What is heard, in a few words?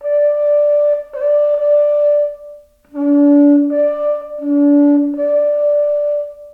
Conch shell; Vikidia